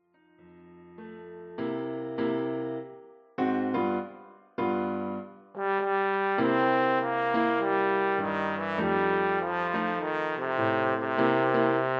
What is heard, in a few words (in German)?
Posaune, Klavierbegleitung